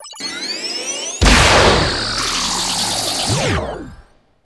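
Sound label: Space Station Gunshot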